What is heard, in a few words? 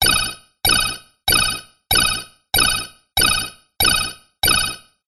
science fiction computer alarm thin beeping loop; zapsplat